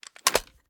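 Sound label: pistol reload empty xmag stock end; gmod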